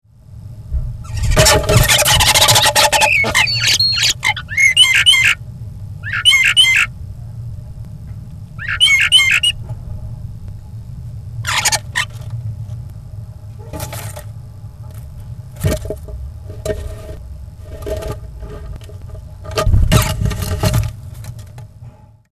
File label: starling